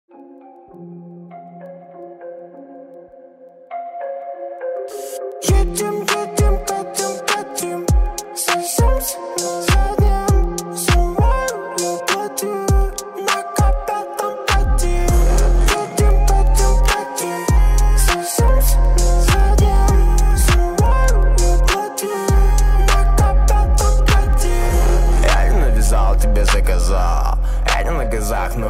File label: Groove